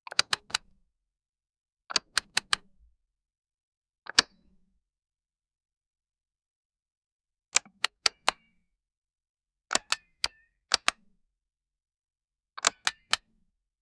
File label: deep reverby ui button pr